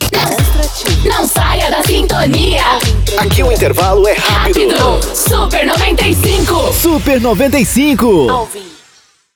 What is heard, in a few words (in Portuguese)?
ENTRADA BREAK; PACOTE ENTRADA E SAIDA BREAK